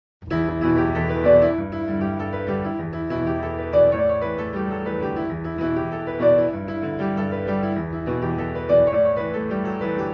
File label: piano